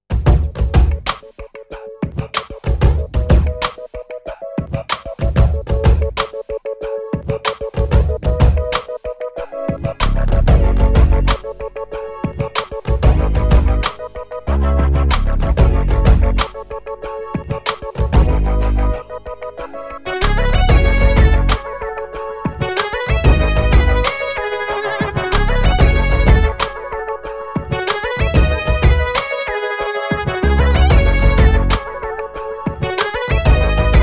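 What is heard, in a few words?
SE Cool R&B Shine, ringtones; Sony Ericsson; PlusFourSix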